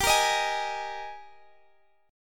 Ab Major 7th Suspended 2nd G, Guitar

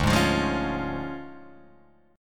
D Minor Major 7th Flat 5th D Guitar Open G

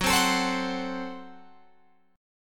Gb Diminished Guitar Open F

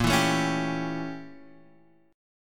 A 7th A sharp, chord; A7/A#; strummed; JGuitar

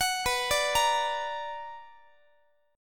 B Minor Major 7th, Guitar; arpeggiated; JGuitar

